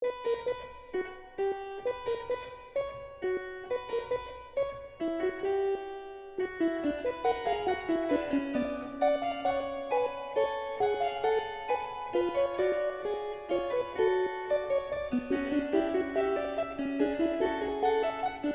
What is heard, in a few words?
Sync Sine Soni